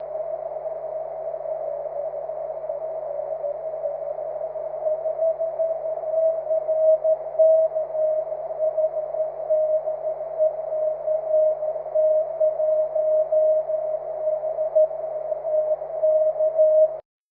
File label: cw; 23cm